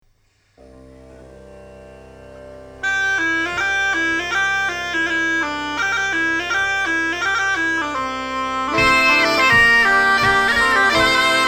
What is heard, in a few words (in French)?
Rythme scottisch Instr Do